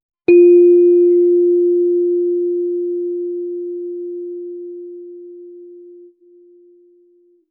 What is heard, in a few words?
krishna bansuri; wind instrument